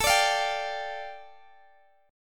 A 7th Suspended 4th Sharp 5th A Guitar Open D, chord